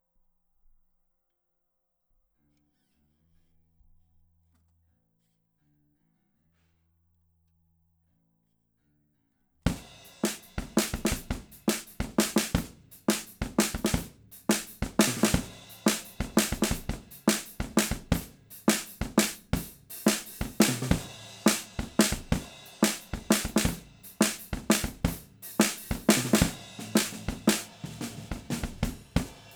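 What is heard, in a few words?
PGA98 Snare Drums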